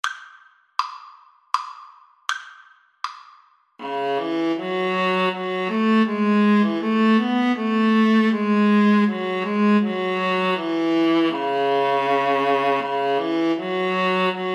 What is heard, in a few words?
holst jupiter, Viola Cello Duet